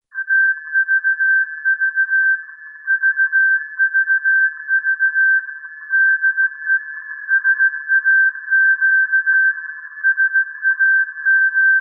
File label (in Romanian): receptie filter mono; vlf; saq